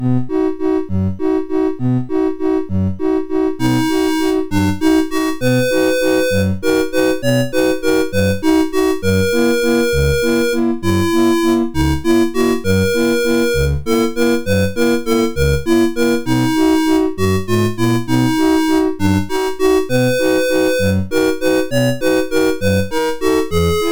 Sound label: Digi Hits, MOS6581R3